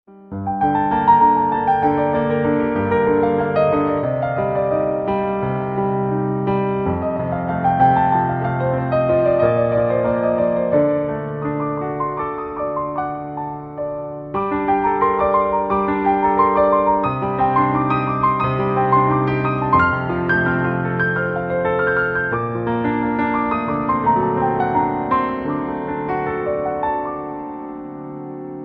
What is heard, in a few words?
Piano Cover